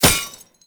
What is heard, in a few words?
Medic Drone Hit Notification; Abilities; Warframe; Tau; Dax Medic